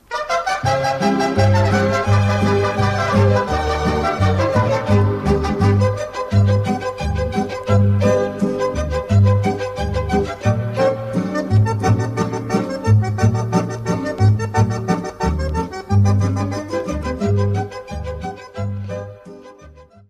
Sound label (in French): Scottish Mexicaine - Mexique